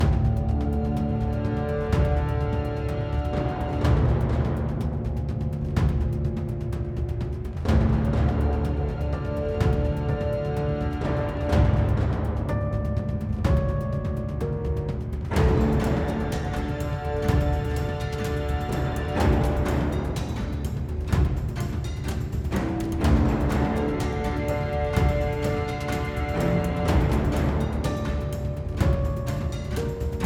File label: Pre Combat Loop, Orchestral Music; fantasy; Orchestra Fantasy Music Pack by OccaSoftware